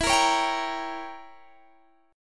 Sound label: A sharp Diminished 7th E